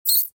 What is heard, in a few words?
rat idle; STALKER; customnpcs